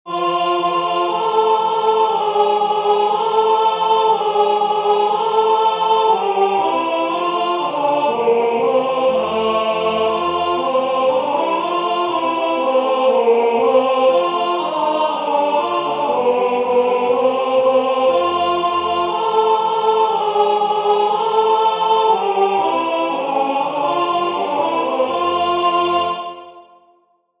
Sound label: Rocking Carol alto; Christmas; Waiheke Island Choral Society